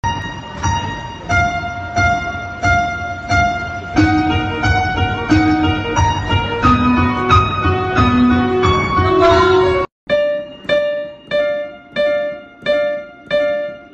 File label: piano song